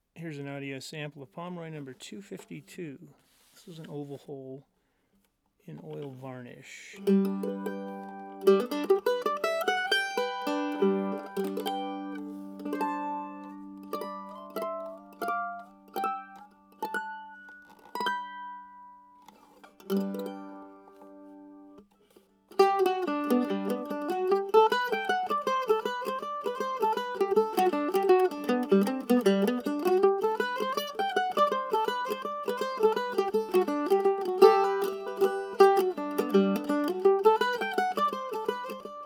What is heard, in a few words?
Gypsy dance